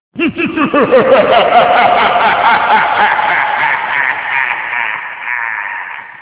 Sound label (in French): rire diable